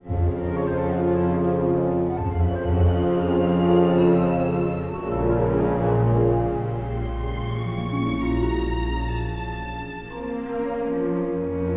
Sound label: suspense, music